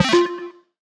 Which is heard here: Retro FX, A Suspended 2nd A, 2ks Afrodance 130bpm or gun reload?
Retro FX